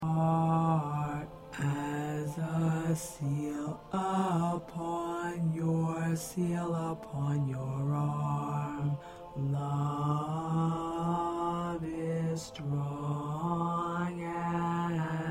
Bass 1

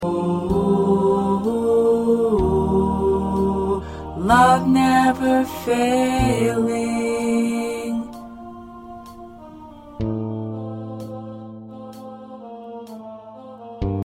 Tenor, choir parts

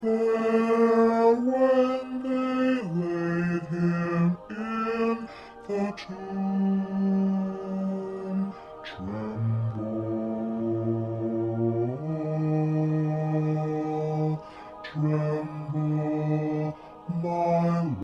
Bass 1

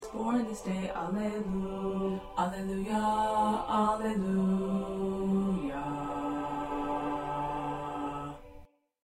1st Bass

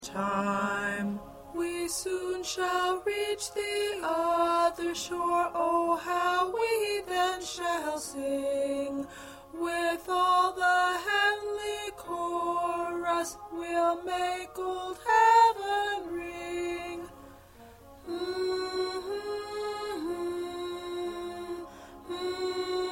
2nd Alto

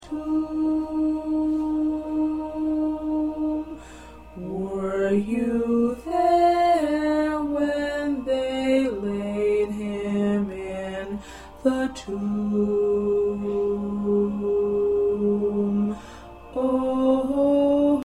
Alto; Were You There

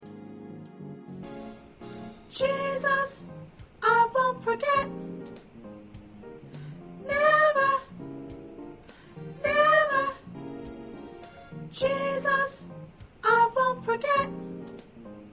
Soprano, choir

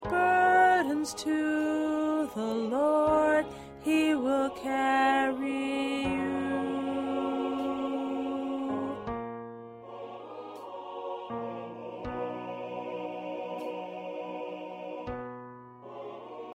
Lead Alto, choir

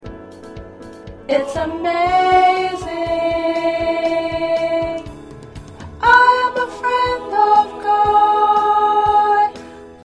Soprano

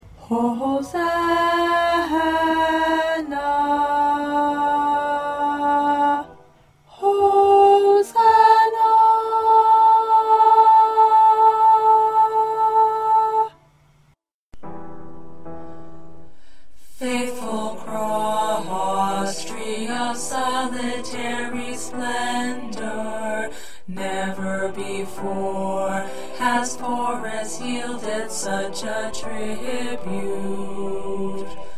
1st Tenor

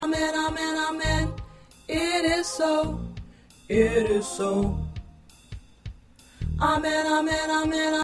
Tenor

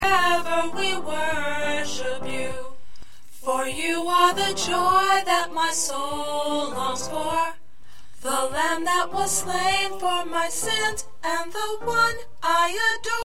Alto, choir

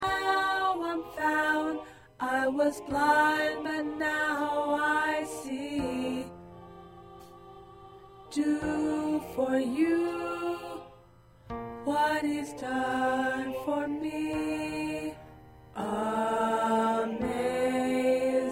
Alto

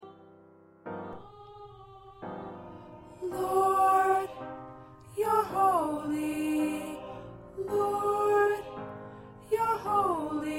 Soprano, choir

